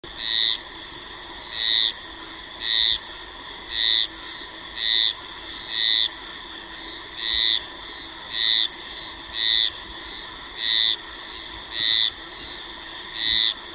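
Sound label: Dendropsophus melanargyreus, frog; guyane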